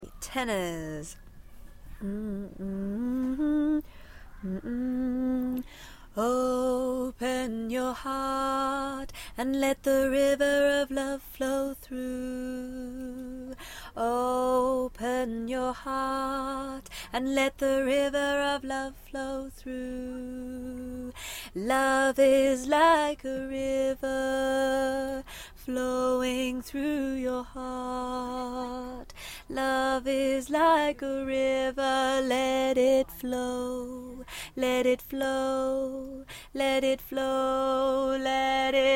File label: (tenor); Choir